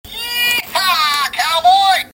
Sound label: Yehaa cowboy